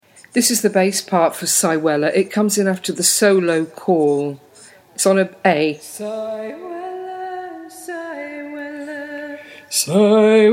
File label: bass